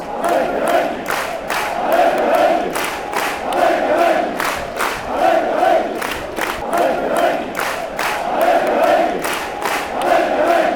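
atheltic atheltic clap clap, football songs and soccer chants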